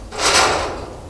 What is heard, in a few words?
metal